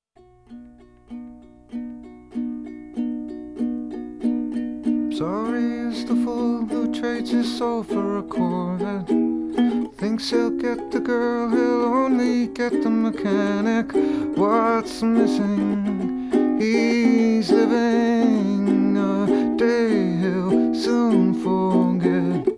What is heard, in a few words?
Binaural